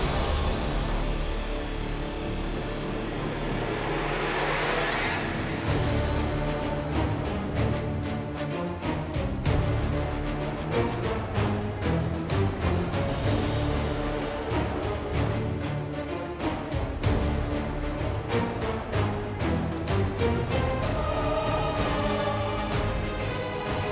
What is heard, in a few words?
Battle Boss Demon castle Upstairs